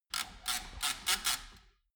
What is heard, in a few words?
nut runner wheel change